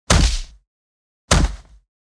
Low end heavy impact punch With And Without Whip Cracks; Custom Tmnt 2012 Inspired